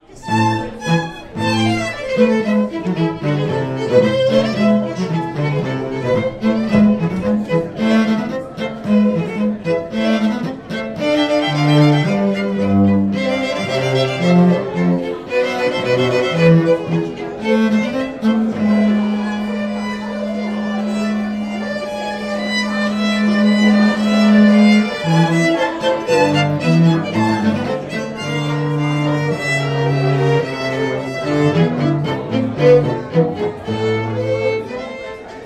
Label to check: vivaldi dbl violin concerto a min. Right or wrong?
Right